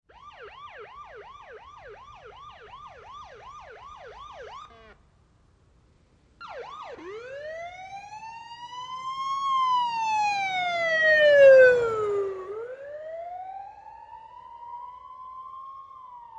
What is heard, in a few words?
Police Siren In From Distant. Starts As Whooper Changes To Wailer. By Fast And Close, Away.